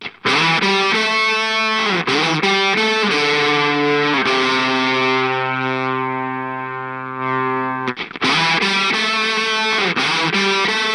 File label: Octave Clang Octave up bright; Death by Audio